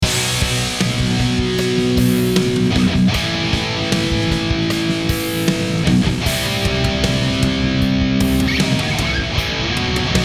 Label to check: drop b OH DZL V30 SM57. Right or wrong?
Right